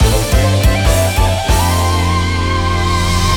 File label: Jazz Rock version Intro